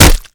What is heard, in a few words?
Projectile Sounds; Red Orchestra Ostfront 41-45